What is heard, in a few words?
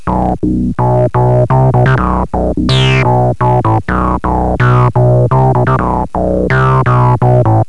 bass riff sweep, techno; Terra Sound Library